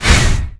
Shock Rifle Deploy; weapons; Requests Studio; UT3

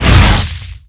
Link Gun Deploy; Requests Studio; UT3

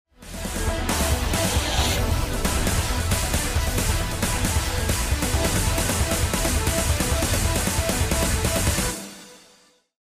round ten sec count; music kits custom

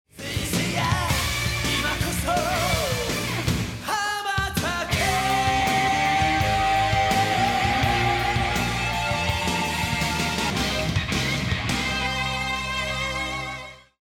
choose team; music kits custom

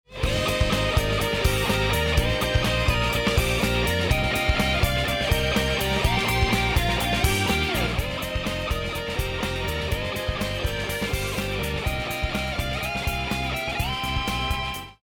start action; music kits custom

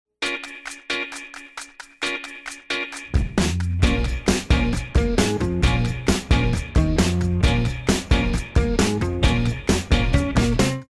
bomb ten sec count; music kits custom

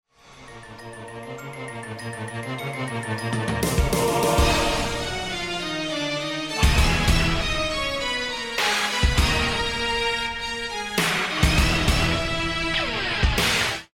won round; music kits custom

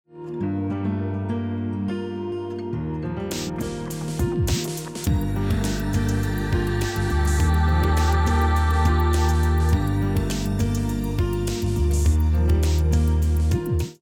lost round; music kits custom

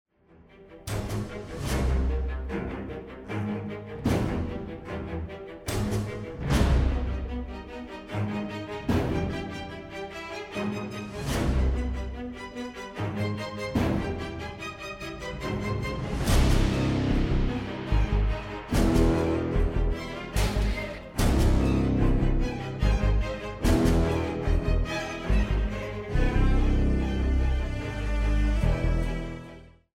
bomb planted; Outlast

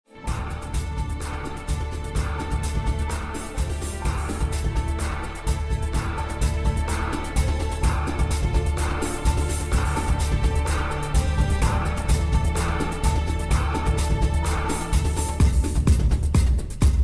bomb planted; music kits custom

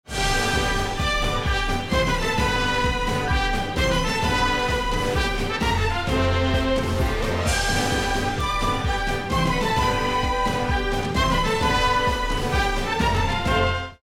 choose team; music kits custom

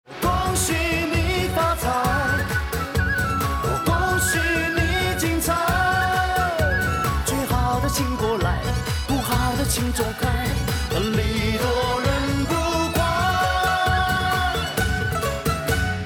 start action; music kits custom

